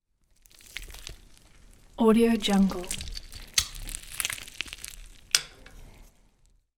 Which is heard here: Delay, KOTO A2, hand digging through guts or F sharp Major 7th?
hand digging through guts